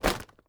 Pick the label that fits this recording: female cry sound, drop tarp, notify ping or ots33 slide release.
drop tarp